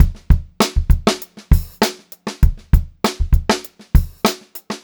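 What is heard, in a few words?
STRAIGHT BEAT, Funk-Groove Drumloops; USB Soundscan vol.38; AKAI; 90 s Sample CDs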